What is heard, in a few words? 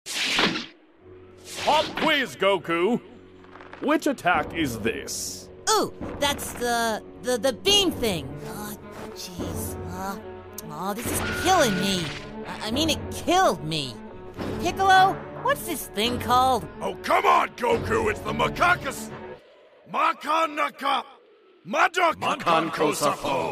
Cannon; Makankosa